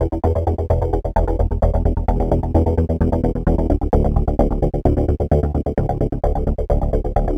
E Bass Spitfire, 130; Zero-G; Future Wave

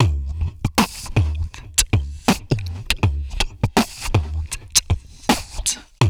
VOC DRUM, MOUTH PERC; Best Service Hyper Dance

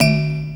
Ethnic Hit, One Shot Drums

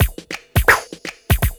DRUMLOOP, Ragga & Dub Reggae

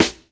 SD, snares; EastWest; Dance Mega Hip Hop Electro

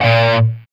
SOLDANO ROCK, Guitars

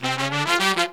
Pop & Funk Brass

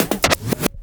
REWIND

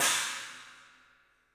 CYMBALS; 3D Sound; 3D Drums

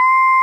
VOX WAVE C5, trance

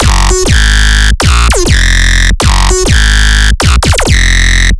simple dry, bass

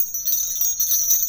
Fast Bell Loop

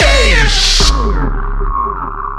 vox FX; Big Beat Samples & More

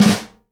SNARE RUFF, Live Snares; PlatinumPhattCD1; E-MU Producer Series Vol. 8 – Platinum Phatt

